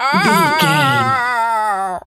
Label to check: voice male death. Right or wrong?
Right